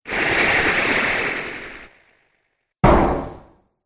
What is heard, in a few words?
Magic Spells; Magic Sound Effects